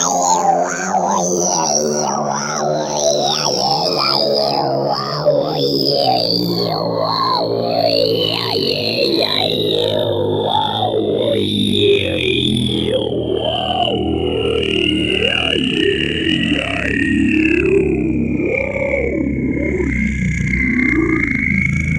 Gremlins Ish

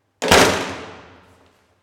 Bang

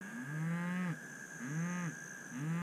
Cow Moo

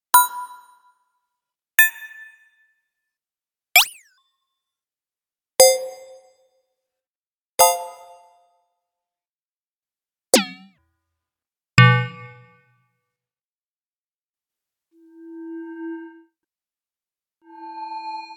Button Set

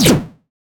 Laser Shot 8 Bit

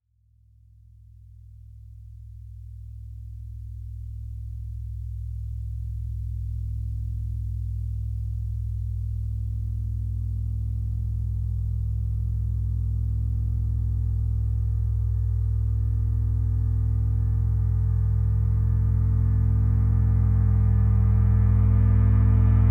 Cymbal Reverse

